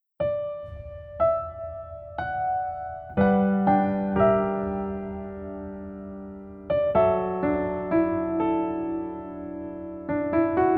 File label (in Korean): Piano ver 멜로디MR 남자키